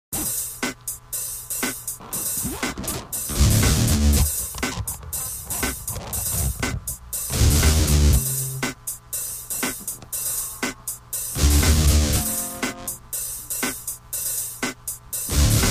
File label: piano